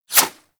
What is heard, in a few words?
bow Whoosh; Warframe; Weapons; Tenno; CYXbow